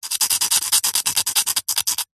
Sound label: stone rock carve engrave scratch fast with small sharp metal spike; foley